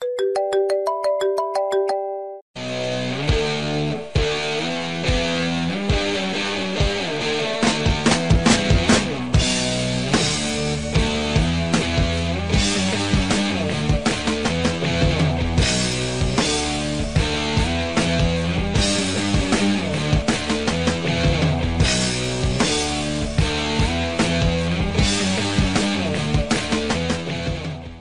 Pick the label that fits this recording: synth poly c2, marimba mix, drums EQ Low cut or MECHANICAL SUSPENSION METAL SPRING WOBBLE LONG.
marimba mix